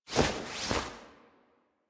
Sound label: Idle Screen Foley Brush; Warframe; Corpus; Corpus Lich